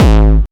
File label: Bassdrums Hard Kicks; Vengeance Samples